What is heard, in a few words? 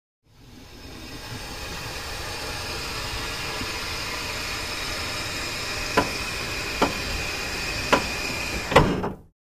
Hydraulic door